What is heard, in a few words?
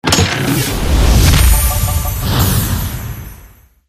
free spin chest; fairyhansel